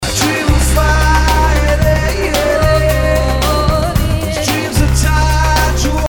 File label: heeheheh